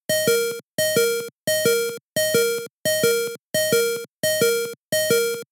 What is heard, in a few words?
In-Battle Health Low Loop